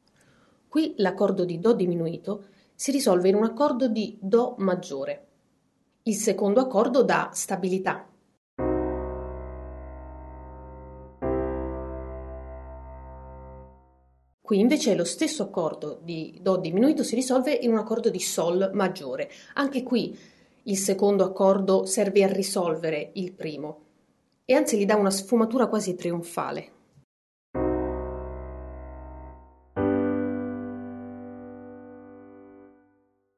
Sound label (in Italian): do diminuito do sol